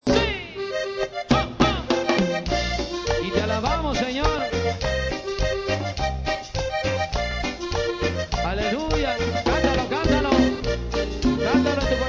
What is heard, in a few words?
cumbia